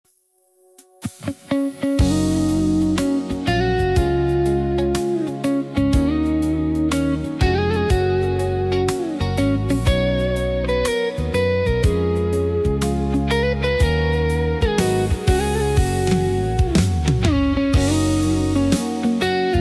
Happy Birthday Electical Guitar Lead, instrumental Version